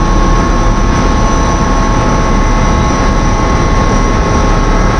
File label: abrams idle